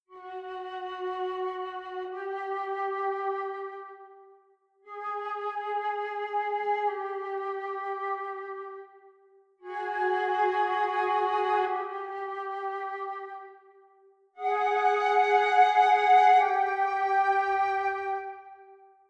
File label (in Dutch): dissonant oplossing